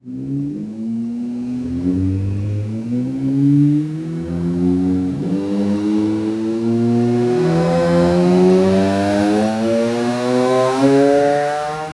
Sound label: big luxury electric car; automobiles